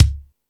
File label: Lotsa Kicks, Drum Machines